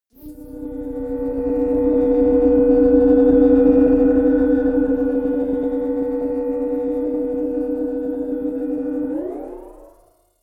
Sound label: whoosh drone swell long